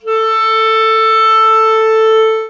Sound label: clarinet a3